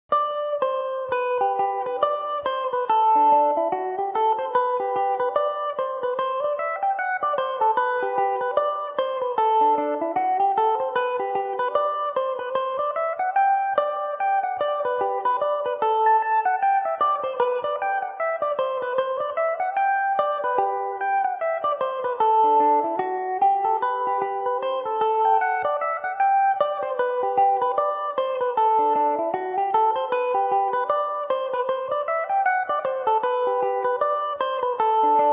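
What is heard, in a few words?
G major mandobird, irish mandolin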